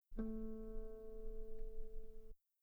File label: guitar A3 very long piano harmonics; bananenkeyboard